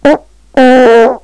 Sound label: musical